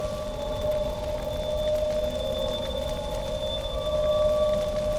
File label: loop; destiny; LoL; twisted fate